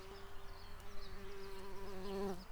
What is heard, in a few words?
bumblebees, Hypnorum workers